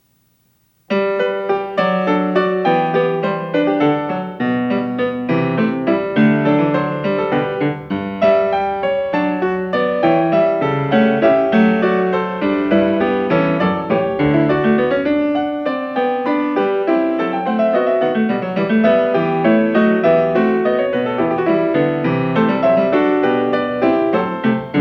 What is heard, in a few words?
Variation 1 a 1 Clav., PIANISTS; BACH; GOLDBERG VARIATIONS